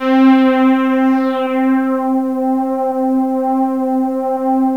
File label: Halo Pad, Synth pad; gmtones